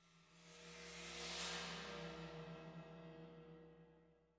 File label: Perc